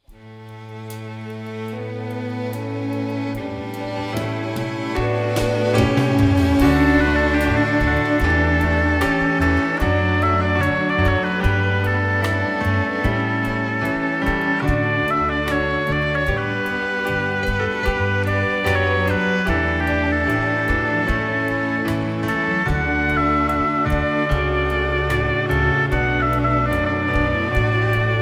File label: orchestra